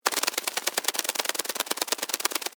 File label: Insect Wings Fly, Creature; Animal; Animals:Insects